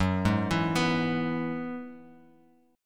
F sharp 7th Suspended 2nd Suspended 4th F sharp down Bass Standard; F#7sus2sus4 arpeggiated